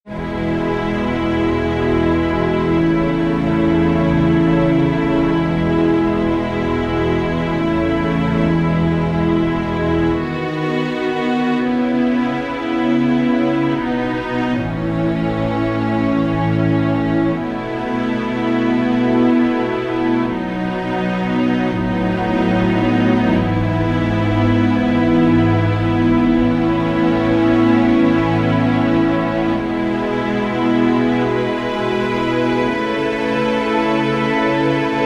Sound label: Underscore PAD; Worship Flow